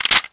pistol out; Pirates Of The Caribbean; DUEL

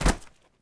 bodyfall, foley

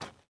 fs dirt, footsteps

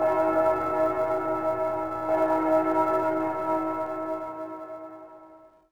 bell; london

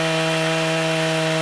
skidoo; Colin McRae Rally 3; XBOX

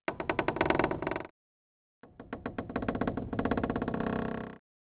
wood creak loop; Ambient; MX vs ATV Unleashed

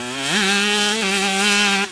engine

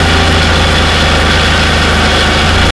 car idle; garage; Max Payne

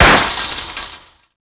van crash through wire fence; cars; Max Payne

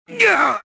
choke; Star Wars Jedi Knight II Jedi Outcast; XBOX; bespincop

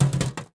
blaster hit metal; objects; Star Wars Obi-Wan; XBOX

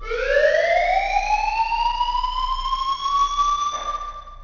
Alarm; Project Gotham Racing

